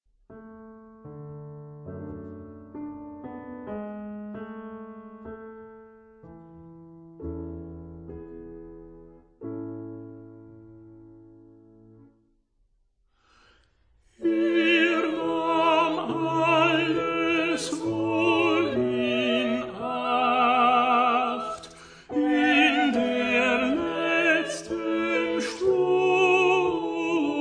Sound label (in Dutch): Er nahm alles wohl im Acht tenor zang